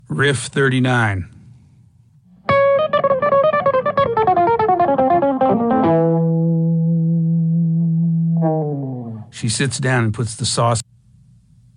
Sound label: Riff